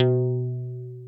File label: HARP B1; Yamaha VP-1; Music Store; HARPOPHON